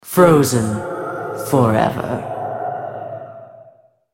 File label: attack; BlackIceAnivia